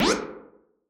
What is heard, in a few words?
Jump; GlobalGameJam-2021